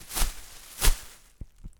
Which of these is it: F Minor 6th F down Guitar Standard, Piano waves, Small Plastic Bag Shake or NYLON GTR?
Small Plastic Bag Shake